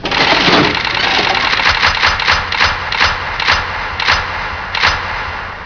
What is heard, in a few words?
Spin; Russian Roulette; SFX as the lever is pulled and the lights flash around the Drop Zones.